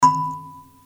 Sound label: railing single knock